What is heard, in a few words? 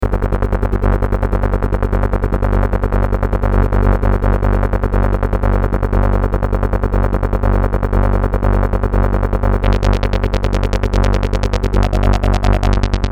ARP4 BASS